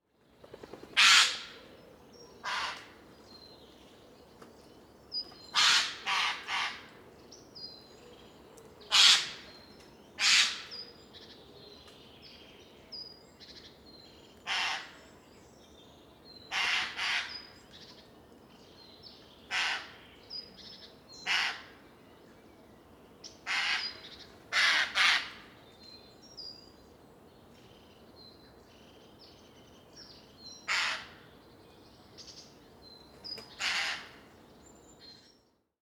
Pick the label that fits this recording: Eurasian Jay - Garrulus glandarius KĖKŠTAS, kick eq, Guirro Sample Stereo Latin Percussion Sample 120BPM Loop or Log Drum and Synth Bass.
Eurasian Jay - Garrulus glandarius KĖKŠTAS